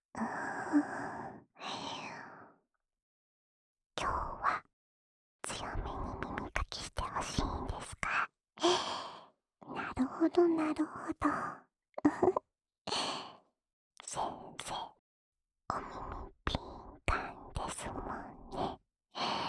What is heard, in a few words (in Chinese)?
安眠用 咯吱咯吱的掏耳循环（左耳）